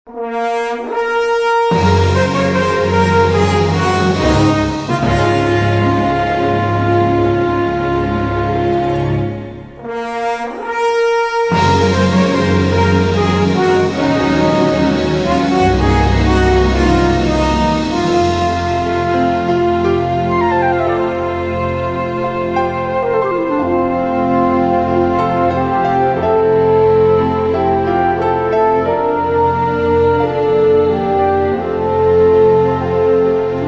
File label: Orchestra